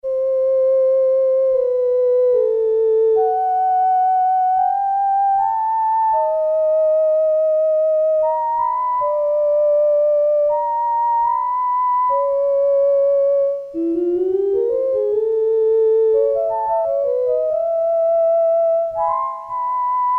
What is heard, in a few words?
flute, Casio SK-1; Factory sound